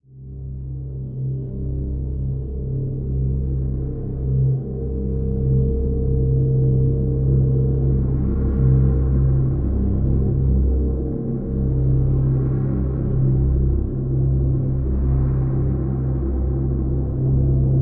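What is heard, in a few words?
drone C; Drn Caverns Deep C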